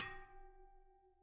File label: Bicycle Spoke